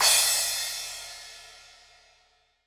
DW Green Maple Cymbal Crash; Sonic Foundry (Sony Creative Software); Vital Drums

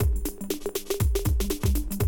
120, Classic Drum Loops

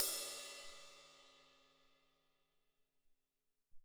Amb Rde

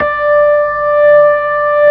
ORG B3, organs; Sound & Vision; Gigapack I CD 2

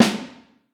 Vintage Snare Drum; 4X14; Q Up Arts; Johnny.C's Vintage Snare Drum

